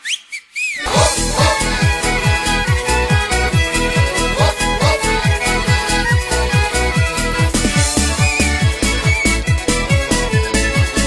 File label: polka; lietuva; ringtones lituanos